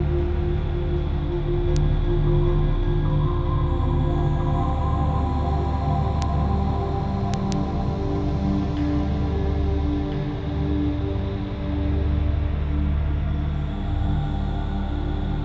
ETHEREAL TEXTURES; Sonic Foundry (Sony Creative Software) - Future Retro Dance Excursions

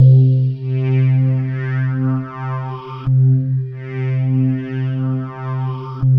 quartz C3, ethereal atmospheres; Soundscan - Ethereal Atmospheres

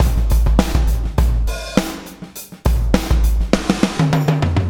BLOW, BLOWUP

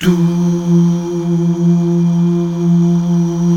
Mal Duh; Best Service Gigapack 1

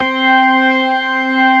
STOPS, ORGAN; Keith Emerson Signature Series

